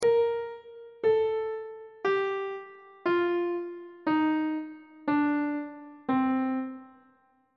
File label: c dorian mode descending on treble clef